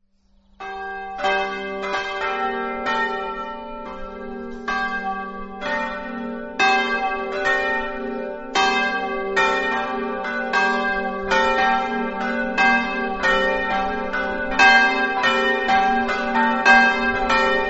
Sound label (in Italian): driolassa, campane